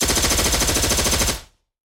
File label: Assault Rifle; SoundsCrate